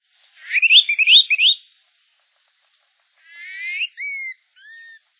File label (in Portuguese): soldadinho